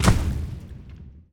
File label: Earth Tank Footstep; AudioWizards